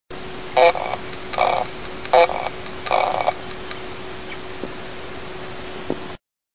WD Motor Heads, damaged hard drive sounds

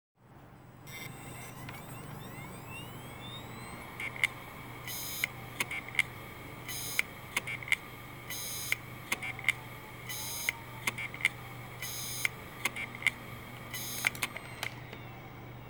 Heads toshiba 3,5, damaged hard drive sounds

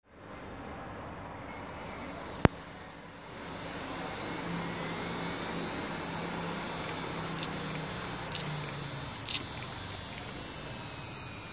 Heads seagate, damaged hard drive sounds

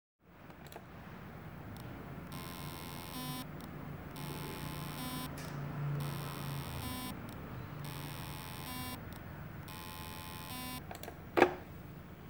Stiction WD 2.5, damaged hard drive sounds

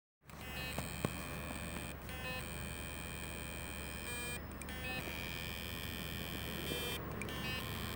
Stiction WD 1TB 2.5, damaged hard drive sounds

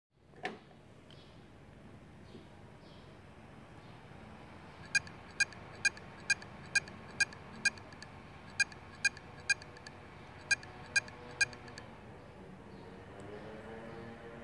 Heads wd 1tb, damaged hard drive sounds

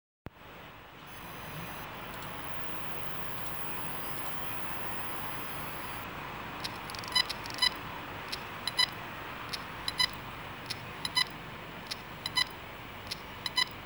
Heads seagate, damaged hard drive sounds

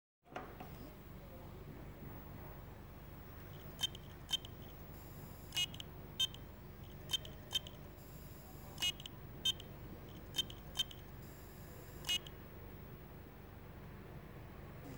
Heads motor Maxtor 2tb, damaged hard drive sounds

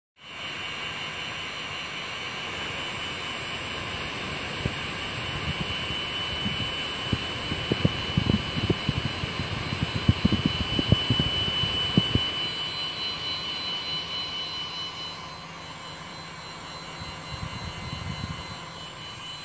IBM SCSI, damaged hard drive sounds

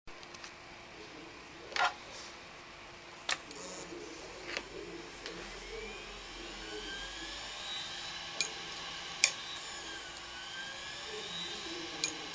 WD Raptor X, damaged hard drive sounds

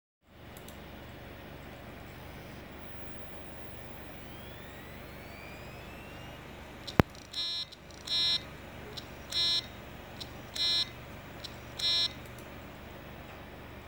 Heads sg, damaged hard drive sounds

